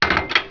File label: lever; Heretic II Demo